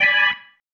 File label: Stab One Shots, Organ